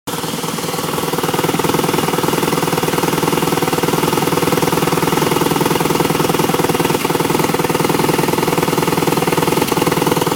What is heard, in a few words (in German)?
BMW R1100GS Auspuff